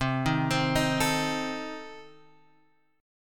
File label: C Minor 6th, C, Guitar, Open G; down; chord shape